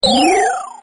Blackberry Ufo

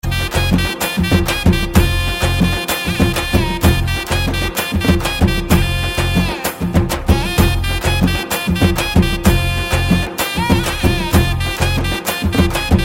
Tamil Hit Tone

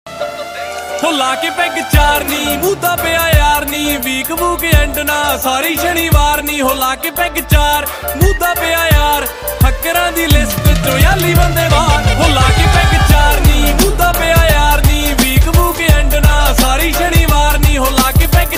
punjabi song ringtone

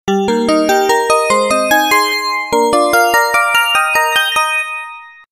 Harp Tone

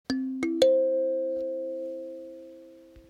Kalimba Text Tone

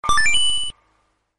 coin; Metal slug

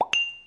Pop Glass

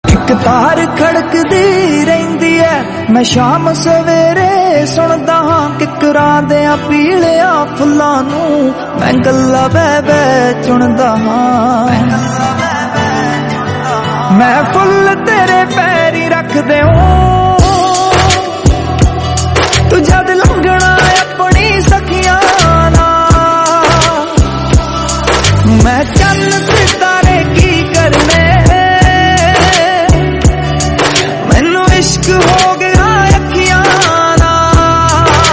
song ringtone

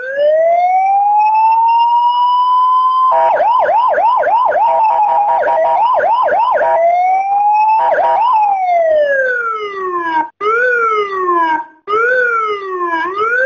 sirine ringtone

